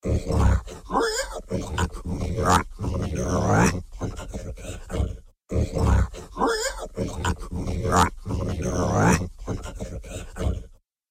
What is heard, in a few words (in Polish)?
świnka